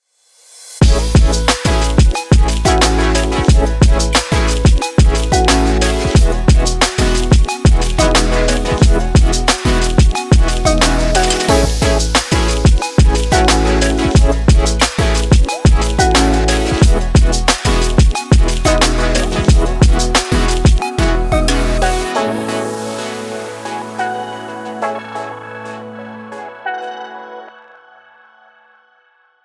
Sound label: travel summer pop